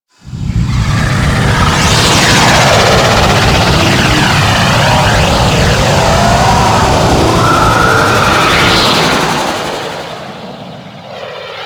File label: Liset Hub Undocking; Warframe; Ambience